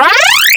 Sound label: Arcade Alarm, Video Game Sounds; 1001 Sound Effects